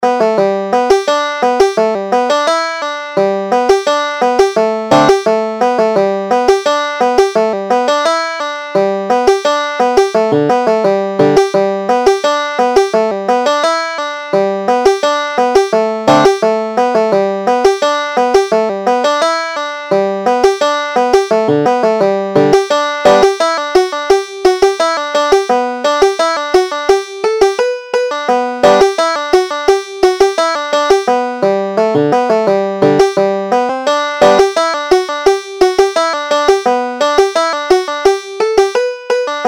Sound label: banjo